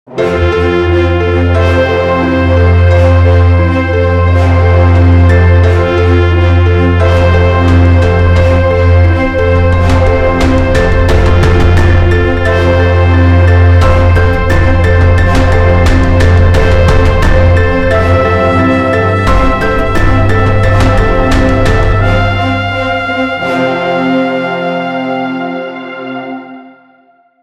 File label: Intro Music; Vaporus; Radiant Crusade VR